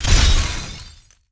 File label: Shield Break; Cryogen